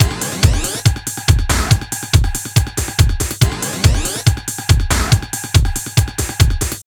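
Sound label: drum loops, right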